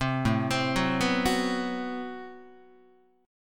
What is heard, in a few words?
A Minor 6th Add 9th, Guitar; Am6add9 arpeggiated; 3,5,3,4,3,5; 4A,4E,5A,5D,5G#,6C#